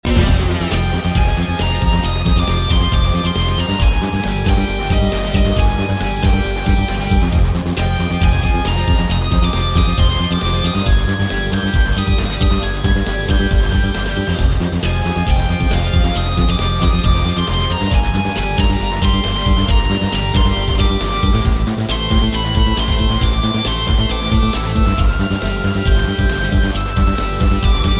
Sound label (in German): Klavier